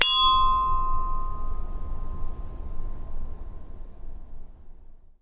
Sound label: chinese bronze bell front; sackler